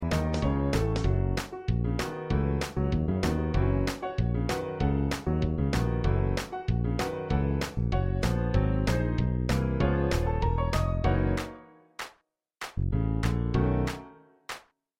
Accompaniment

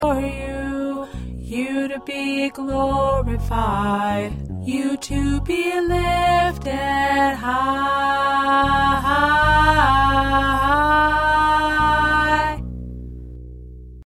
1st Tenor, choir

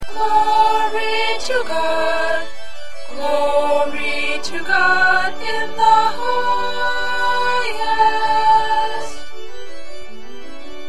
Glory To God Alto

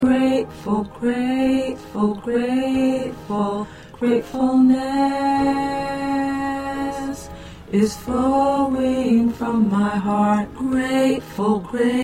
Tenor

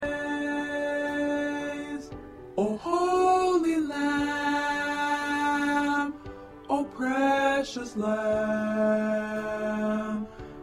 Tenor

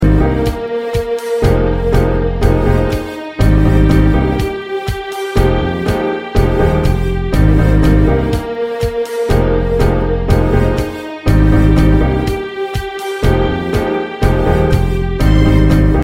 Accompaniment

